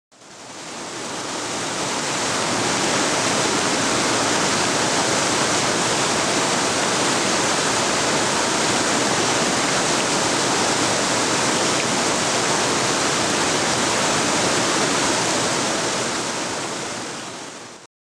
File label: Heavy rain